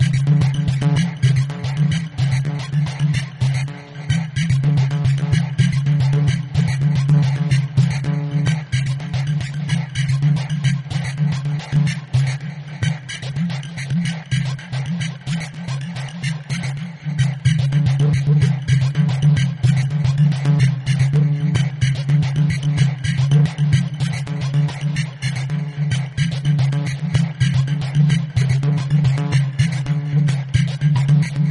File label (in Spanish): la electronica